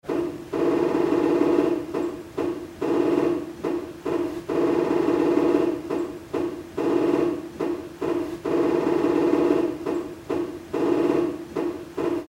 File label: TomTom